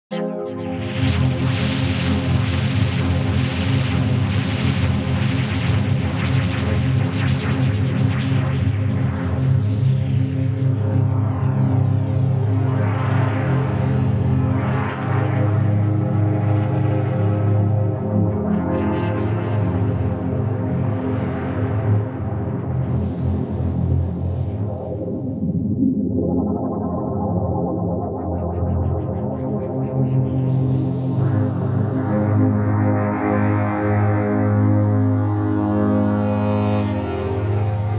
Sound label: soundscape